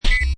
Hi Tech Panel Servo Button

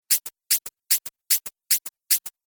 Water Spray Pump Sound; Spraying Sound Effects; Andrew Fleming

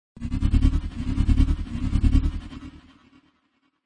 Scary Waves, Scary fluttering vibration.; Scary Flutter Sound; Scary Tones (Sound Effects:Horror)

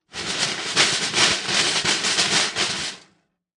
Cartoon Drum Loose Snare, Like rummaging; Comedy/Cartoon; Funny Sounds (Sound Effects:Comedy)

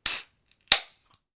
Ring Pull on Can Of Beer; Fizzes; Objects; Tom Hutchings

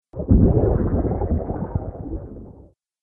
Underwater Element, Water; nature

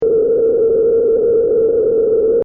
Scifi SFX Loop, Short; Stuart Duffield

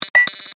Multimedia Chime, Technology, Multimedia, Chime

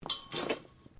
METAL SCRAPE SMALL; Sound Effects:Materials

